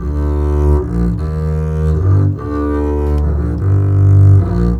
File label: Bass, Cinematic; Sonic Foundry (Sony Creative Software); Orchestral Series VOL-3 - Cinematic